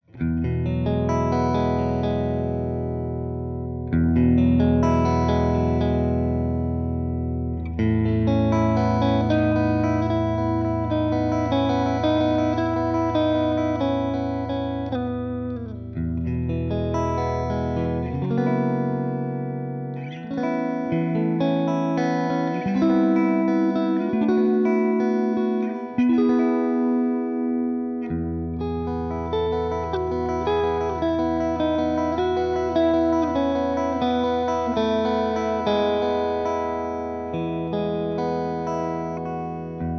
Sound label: Clean